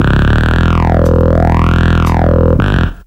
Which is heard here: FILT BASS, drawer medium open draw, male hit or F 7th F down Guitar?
FILT BASS